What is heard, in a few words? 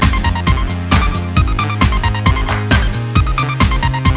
Chime